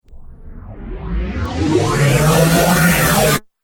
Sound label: Riser Abrupt Stop Flanger; Rise And Dive Sound Effects